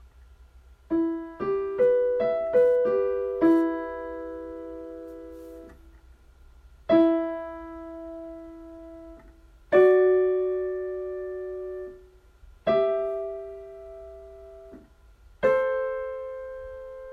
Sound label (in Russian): Интервалы